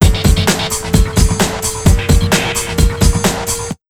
HARD 130 BEAT, Big Beat Drumloops; USB Soundscan vol.25; AKAI

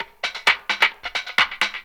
percussion, 130, drum loops; Ueberschall; Techno Trance Essentials; TRIBAL

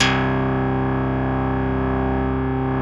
BASSES; Wizoo - Powered Wave

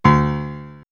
House Piano; X-Static Goldmine; backtothe70's

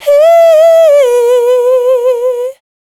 Dance Vocals, FEMALE

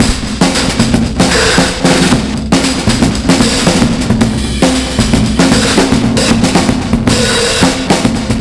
28 114 bpm, Loops Breaks; POTBreaks

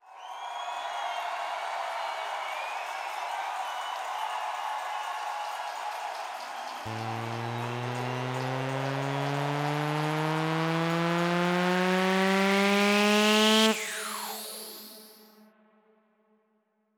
140 BPM FX; Vengeance - Essential Clubsounds Vol.5; Demo Constr Kits; Nessi Kit Root A

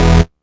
Synth Hit E

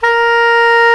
SAX SOP MP A, Soprano Sh; Roland LCDP07 Super Sax

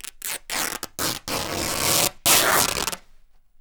RIP, Canvas Bag Rips

